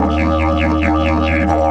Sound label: EF 140, DIDGE RIFFS